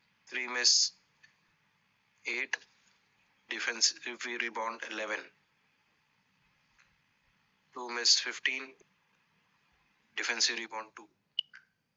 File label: basketball audio; basketball-audio-dataset